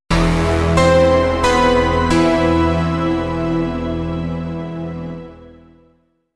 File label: Game Over